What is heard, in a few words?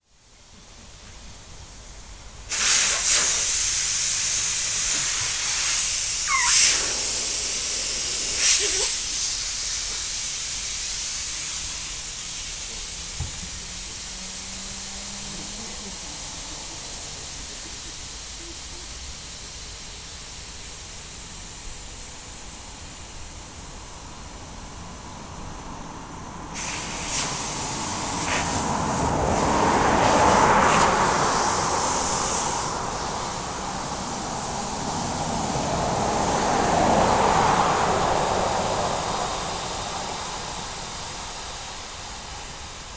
autorepair car wash; krasnoflotskaya; sergiyev posad